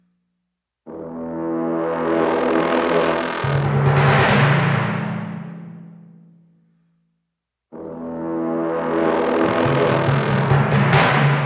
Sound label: TENSION LOOPABLE